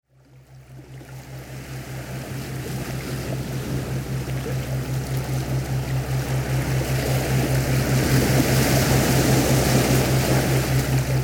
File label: Ocean Waves Theta; binaural music